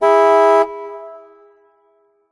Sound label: Music Frontend